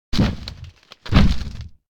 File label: Idle to Walk; Urwin